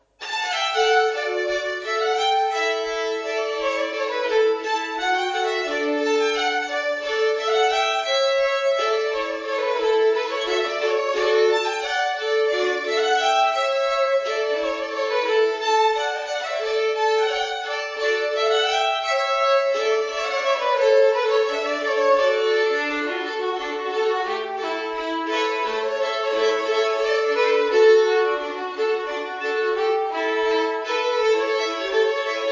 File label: polka; Oscars polka